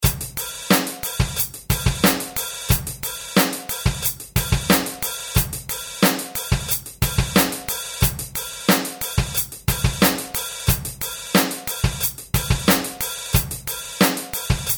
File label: heavy, 90 bpm